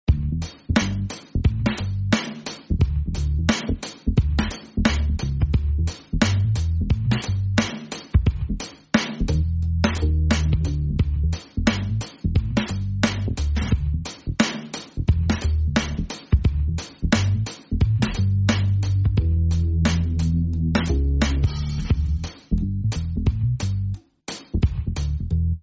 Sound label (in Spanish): Estatica Tranquila Ironica Jocosa Funky